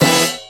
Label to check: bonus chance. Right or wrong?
Right